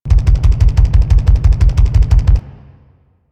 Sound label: Gas Turbine Large Loop; Ambience; Props; Warframe; Corpus Gas City; Props Remaster